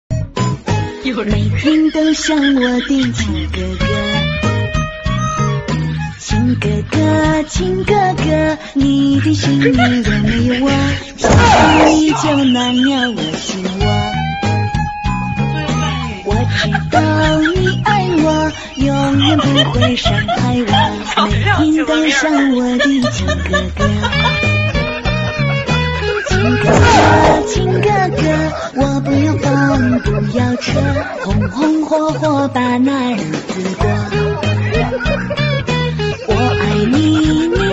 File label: laugh; breadradio